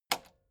metal button spring click slow

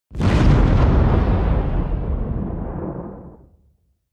missile large fire stationary distant

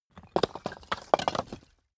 brick drop

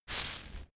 insect shaker hit single soft

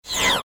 short movement sound

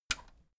metal button radio push disable

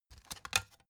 cd take out tonal

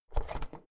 oven knob press in hard

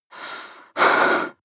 breath female fear

